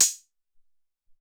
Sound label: M Tek Hat, Alesis SR-18